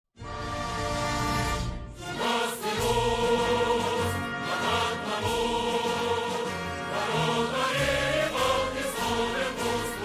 gimn tadzhikistana

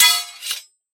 privetstvennoe soprikosnovenie mechami s protivnikom

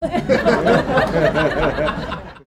zvuk tolpa smeetsya

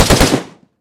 vistreli iz avtomata po dalnej celi

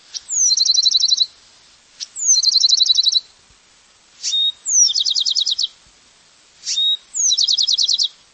raznoobraznoe penie vyurka

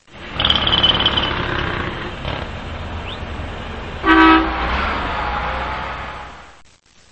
stroitelstvo shumnii blizkii zvonkii

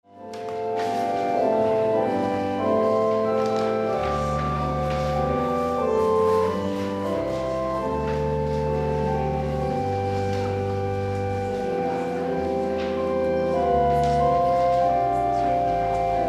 cerkov igraet organ nebolshaya tolpa

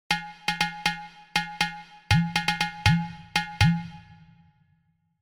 zabavnij multyashnij ritm barabanov

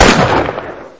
gromkij zvuk vistrela

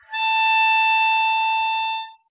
gubnaya garmonika dlinnaya myagkaya nota

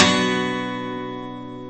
gitara akkord si minor bm

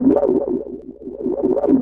sintezator effekt

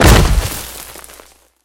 shoroh upavshej i rassipavshejsya pachki hlopev

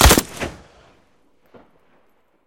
vistrel iz pistoleta razyashij napoval

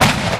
vistrel v blizhnyuyu mishen

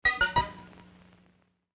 zvuk viklyucheniya displeya

